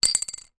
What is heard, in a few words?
food drink beer bottle cap down on table; zapsplat